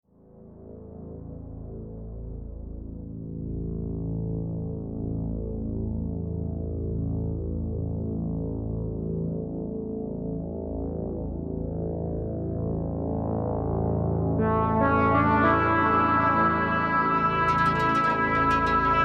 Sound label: cinematic arabic dawn; Unity3D; Dreamworld